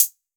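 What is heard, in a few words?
808 closed hi hat; Wizoo - Platinum 24 Electronic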